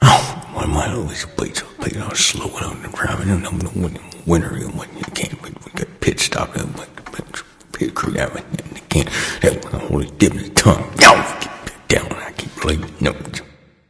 grumble; smite; apollo

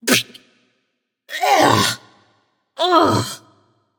nv clear throat; smite; voicepack; morgan le fay

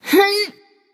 grunt lift; smite; voicepack; scylla

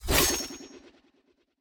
dagger spin; smite; pele

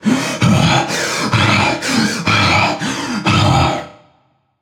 breath

